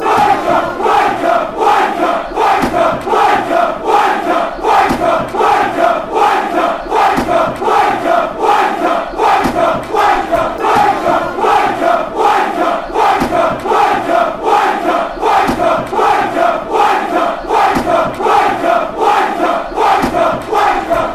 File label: wnker mkdons, fanchants